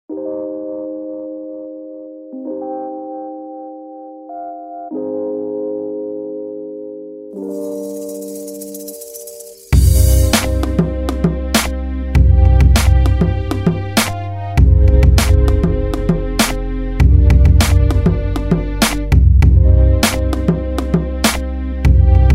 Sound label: hip hop